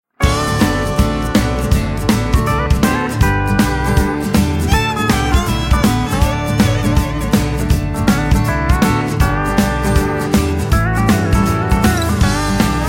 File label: soundtrack instrumental